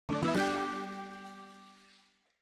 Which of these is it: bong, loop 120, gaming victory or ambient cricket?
gaming victory